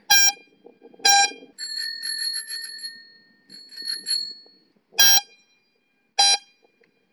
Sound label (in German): klingel airzound